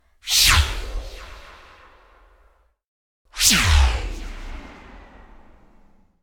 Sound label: Space Torpedo Whoosh